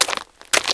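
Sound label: footsteps; Mafie 1 cz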